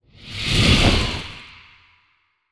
ghost fire swoosh

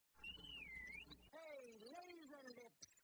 laser lips; meme

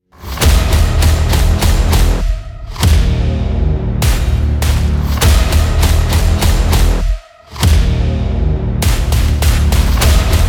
horror action trailer

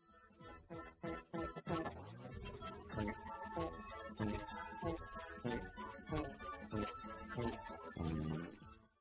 fartfare

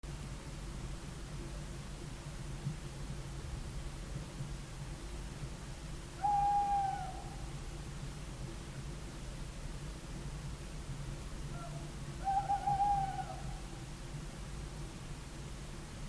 owls

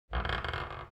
creaking old floorboard

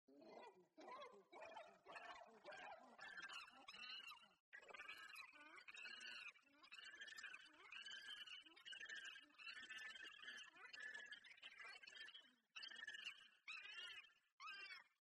angry monkey sound

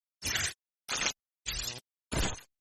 elektric shocks sound

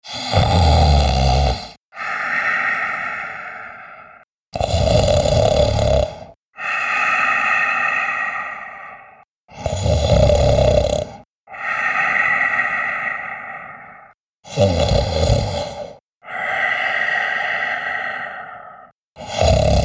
male snoring

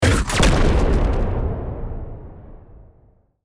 sci fi explosion

